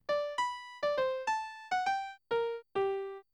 Ivory Piano

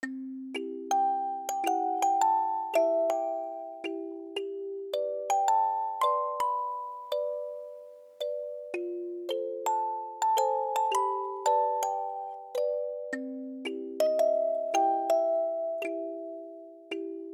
Music Box

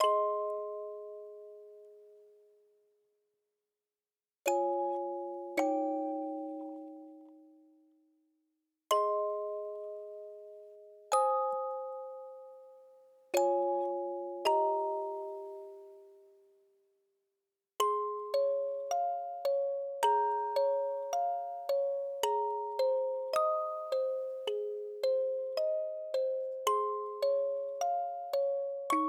Music Box

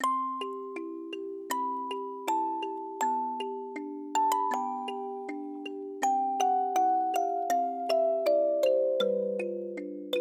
Étude (Music Box)

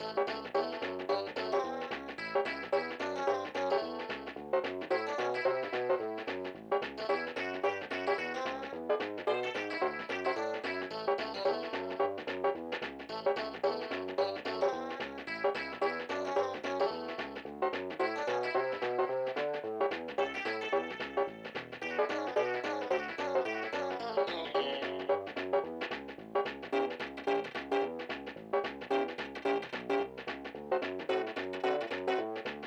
Cartoon TV Show

